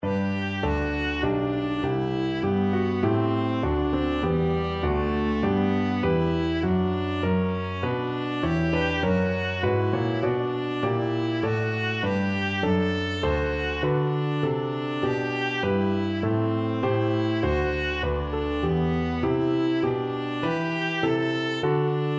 viola

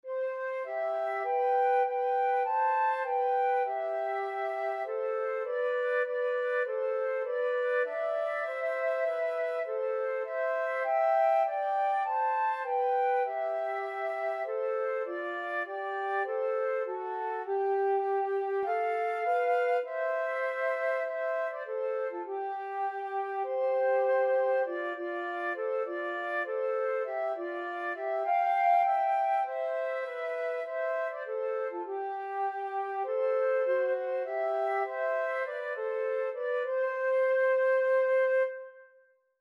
flute duet

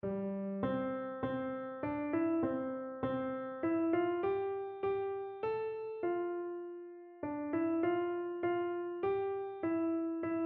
away in manger, keyboard

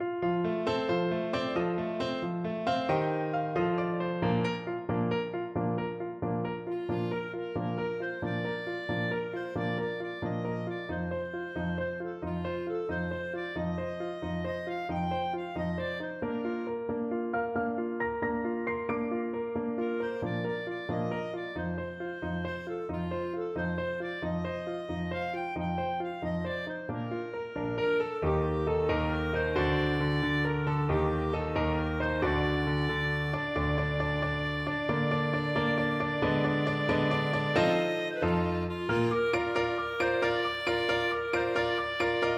ocean wave, clarinet

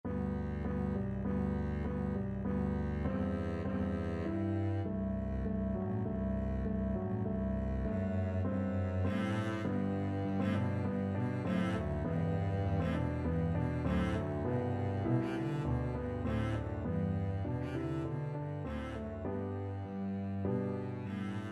bach sheep double bass

